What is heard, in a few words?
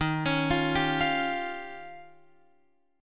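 E flat 13th E flat, down, Guitar, Open F; arpeggiated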